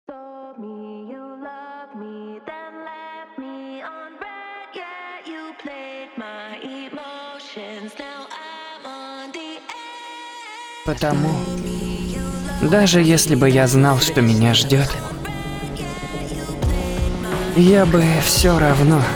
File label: remix